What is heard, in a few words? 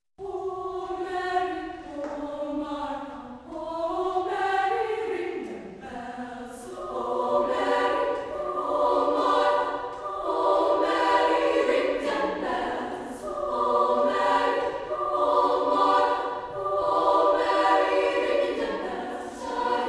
Rockin Jerusalem treble